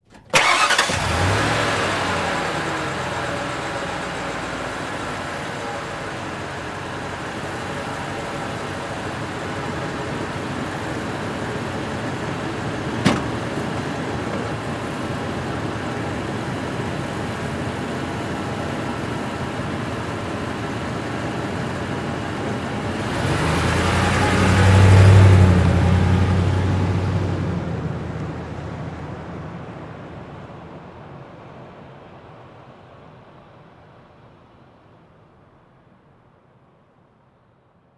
Expedition Start Idl, ford expedition

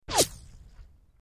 Left To Right Various, bullet bys

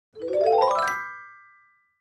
Clavichord Ascendin; keyboards

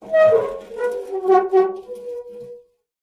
screech, squeaks

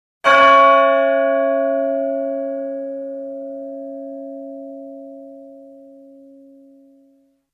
Church Bell Single, bell towers

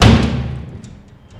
Jail Door Hit

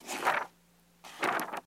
Book Page Turn

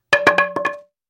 Baseball Bat Wood Dr; baseball fx & games

